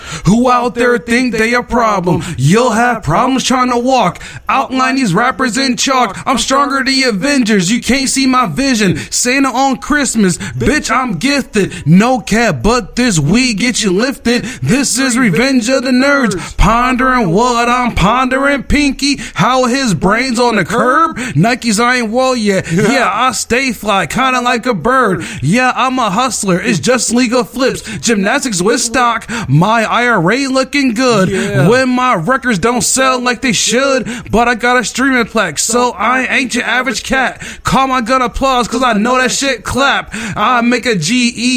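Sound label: hip hop 88 CM, acapella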